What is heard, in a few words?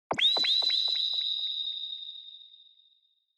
Onoma Flash Low Delay; オノマトペ 光る; 低 ディレイ